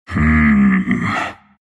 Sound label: pain, ru; dota2